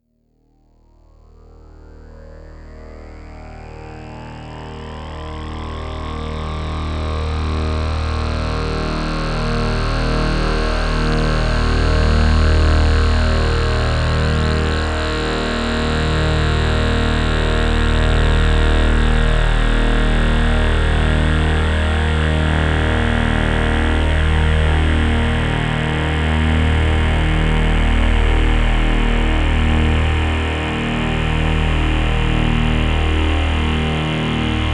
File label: Studiologic Sledge